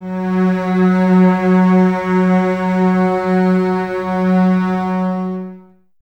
BSS F#3, String Ensembles, Basses, f Full; Miroslav Vitous; 90 s Sample CDs

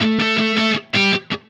Happy Pub Guitar

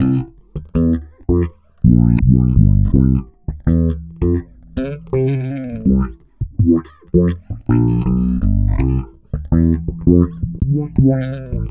Filtered Funky Bass, Electric Bass

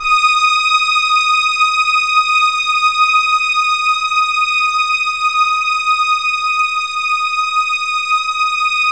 Keypad; Standard Instr; Keypad 2

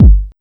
127 DRM, Fresh Disco House

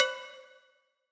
Tin 2, 140bpm; Zero-G Sound Sense Grime Scene; Construction Kits; Astoria

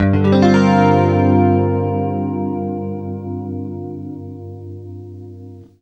CLEAN GM9, Guitar; East West; Steve Stevens Guitar Samples Collection

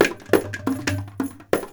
India, Heart of Asia

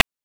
PITCHED NOISE; NES DPCM; MIDINES

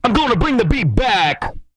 BEAT BACK, R&B Dance, HIP-HOP, Vocal

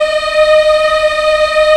African Mm f; Roland LCDP11 Africa VOL-1

